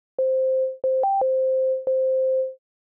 Osc With ADSR

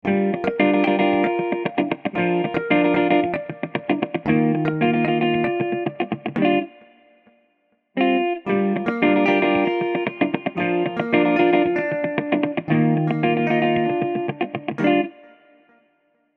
Hollowbody

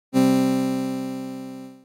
Synth With Low Sustain

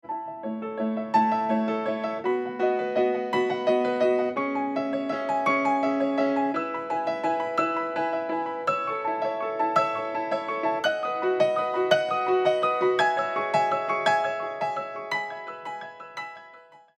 Piano Without Adaptiverb

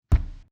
Texture

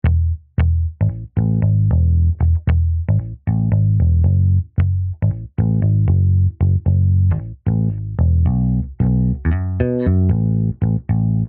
Flatwound Example

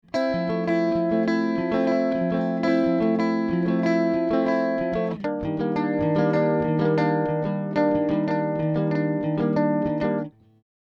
Guitar Volume Balance

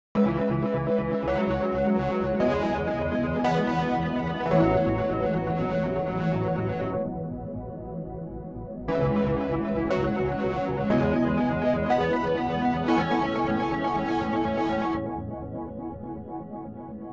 LFO Wavetable Position

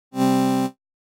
Synth With Slow Decay

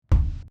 Kick Bus Compressed

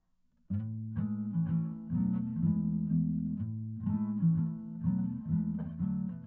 acoustic guitar formant shift 18 semitones down

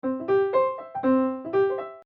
Swung Arp

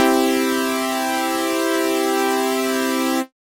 2 Saws

